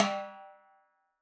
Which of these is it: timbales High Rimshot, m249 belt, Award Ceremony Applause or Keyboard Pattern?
timbales High Rimshot